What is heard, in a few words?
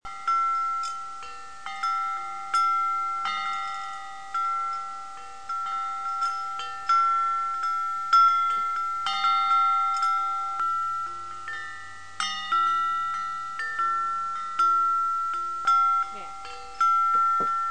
Garden Bell Holiday